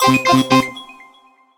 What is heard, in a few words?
Pipe